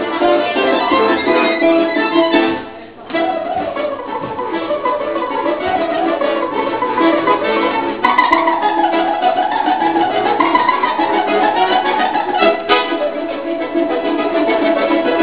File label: russian musicians; Moscow; Dancers